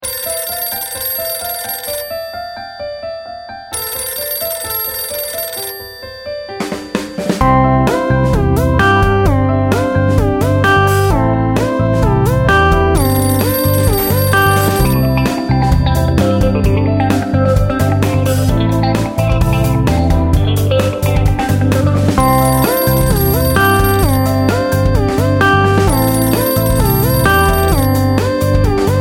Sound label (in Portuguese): toque classico